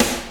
snare drum gate; SNAREDRUMS

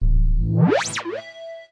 KEY SHOT TEKNO 140 A; Techno2; Singleshots

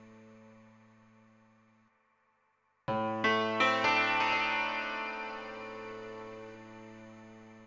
ethno, 125, A

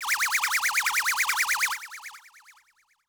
SPACE FX 140; SpaceSounds

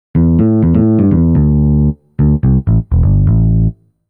DEEPHOUSE BASS 125 A

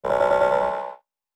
TECHNO FX 140

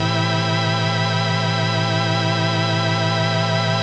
DEEPHOUSE ORGAN 125 A

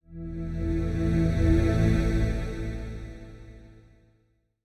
HARD EXTRA 140, Hard Trance

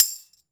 Tamb; RadioactiveSandwich - Homunculus

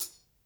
hihat, acoustic drum

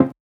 F Synths, One Shots; Keys & Synths; Touch Loops; BlissedOutBeats

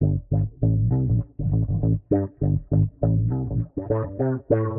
Warped Bass, 100 A; Loops; Weather Man; Touch Loops; CosmicFunk

